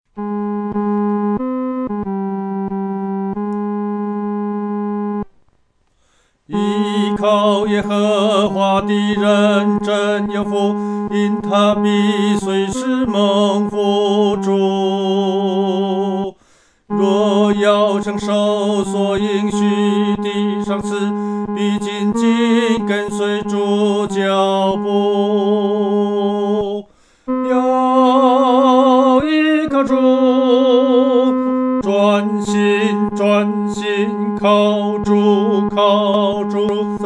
独唱 第三声; 靠主有福

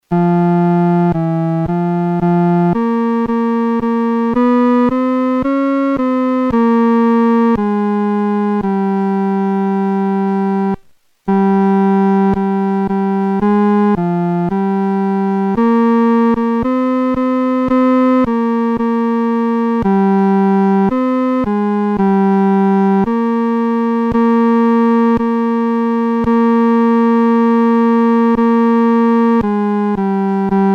独奏 第三声

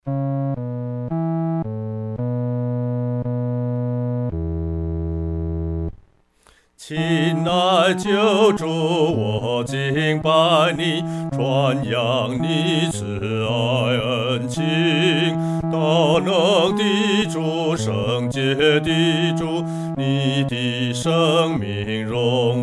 独唱 第四声; 荣耀是主圣名

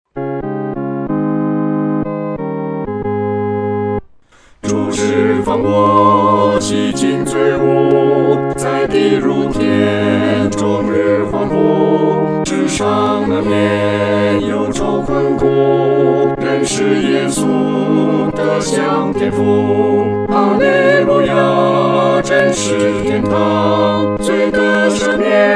合唱 四声部

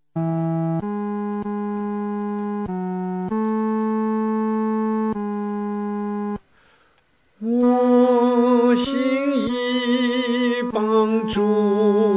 独唱 第三声; 近主十架

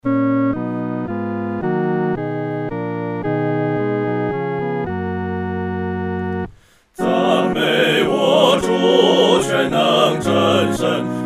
合唱 四声部; 赞美全能神

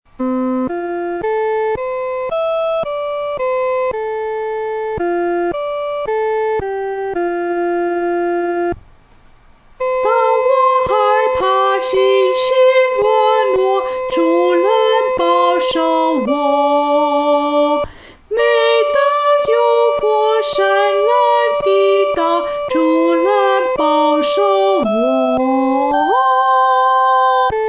独唱（第一声）; 主必保守我

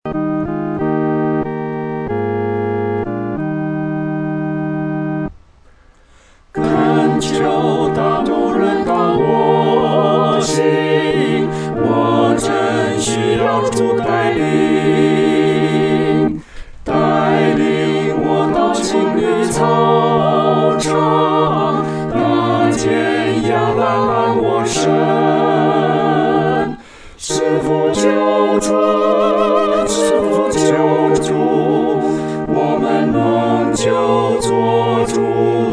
合唱 四声部

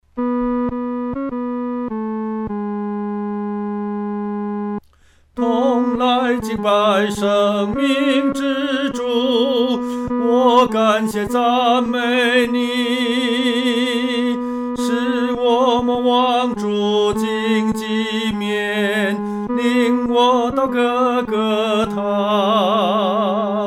独唱 第三声; 领我到各各他

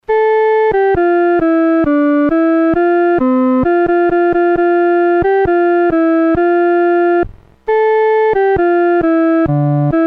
独奏 第二声

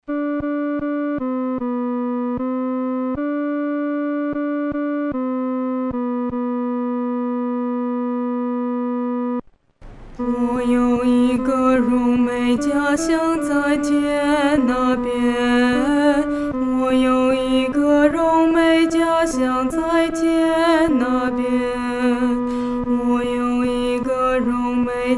独唱（第二声）

